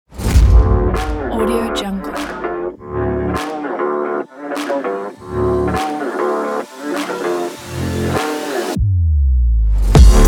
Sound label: sport beat rock